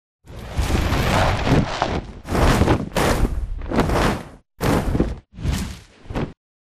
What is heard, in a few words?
flyer dropship attack vertical med dist; boneyard; Titanfall 2